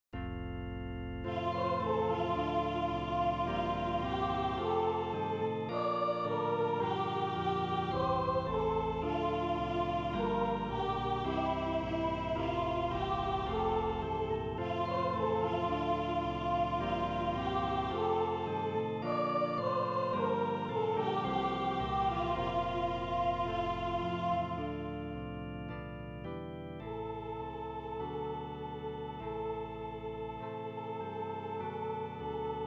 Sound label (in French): Melodie avec orgue et accords; Messe de Verrieres; Rite d'aspersion